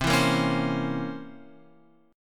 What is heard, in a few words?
C 7th Flat 9th C Guitar Open F; C7b9 strummed; JGuitar